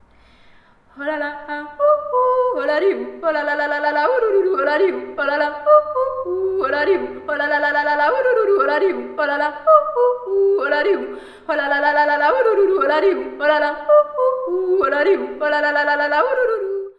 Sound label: Jodel